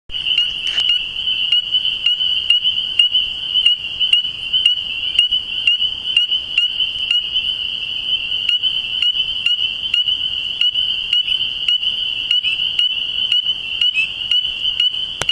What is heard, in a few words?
Pseudacris crucifer